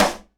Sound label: SIDE STICK, Live Snares